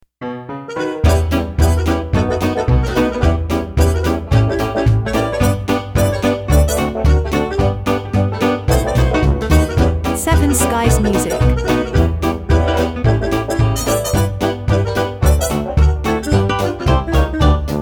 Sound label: Dixieland